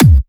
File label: Trance Kicks